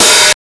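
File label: OPEN HAT DISTORTED; Spectrasonic Distorted Reality 1; RAVELAND RYE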